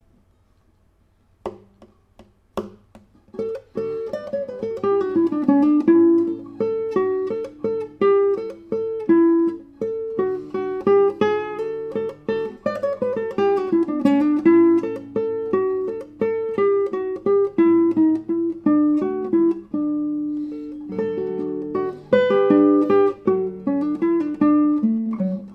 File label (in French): variation1 guitares